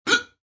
Hiccup Short, Male hiccup, short, restrained, (human voice); Hiccupping; VoiceBox